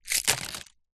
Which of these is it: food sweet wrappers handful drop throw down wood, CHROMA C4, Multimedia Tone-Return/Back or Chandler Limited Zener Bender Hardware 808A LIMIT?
food sweet wrappers handful drop throw down wood